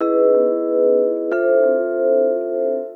D&B Keys Riff; neuro

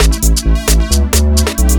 2 Step 133 MIX, House; USB Soundscan vol.51; House Side Of 2 Step; AKAI

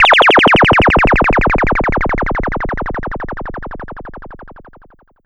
Pollard Syndrum

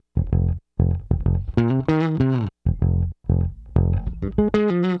Bass, 70s Analog Funk

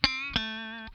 lick scale, funk guitar; Zero G - Funk Guitar

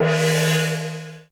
AMB CY GONG, HALL KITS; Crypton - DrumBase5000

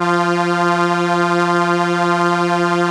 ARP SE F2; Sonic Reality; Vintage Timetraveler

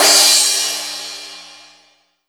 CYM CRASH, DRUMS; USB Soundscan vol.02; Underground Hip Hop; AKAI

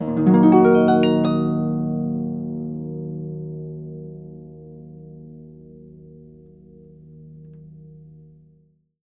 D# MIN; Miroslav Vitous

